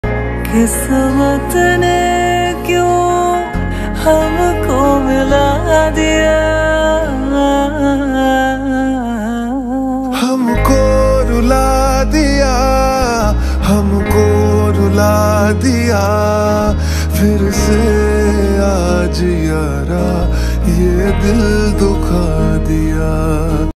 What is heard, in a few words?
slowed reverb hindi ringtone